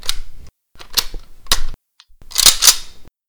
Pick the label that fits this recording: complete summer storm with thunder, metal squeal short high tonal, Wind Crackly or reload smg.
reload smg